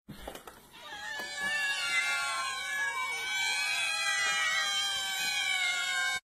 many cats

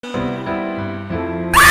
robot

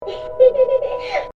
cute giggle

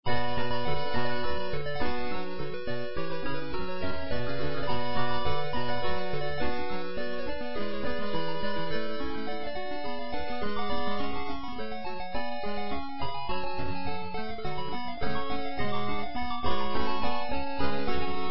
badinerie 8bit

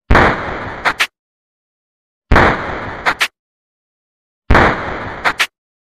shotgun

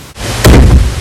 bonk rage

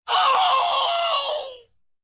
without backround noise; beta mario 64

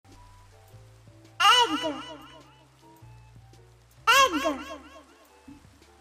egg reverb

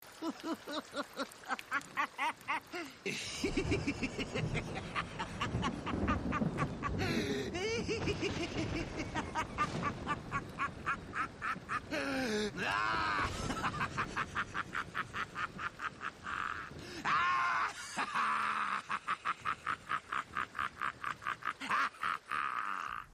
laugh; joker 2016

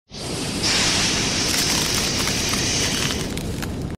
ice beam after effects

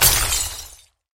crystal explosion; slime rancher

